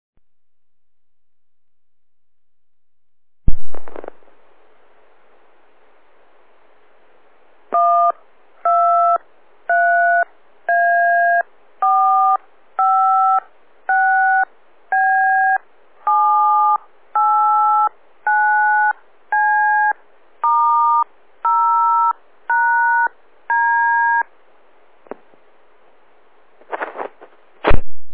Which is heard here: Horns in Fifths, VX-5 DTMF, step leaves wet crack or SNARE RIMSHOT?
VX-5 DTMF